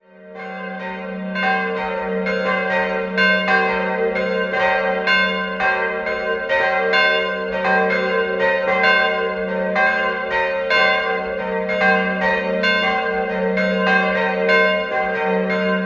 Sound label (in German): Hofstetten, Pfarrkirche, Glocken; St. Nikolaus; 1950; in Erding gegossen; Karl Czudnochowsky